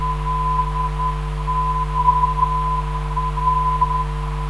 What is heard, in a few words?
LOR 359kHz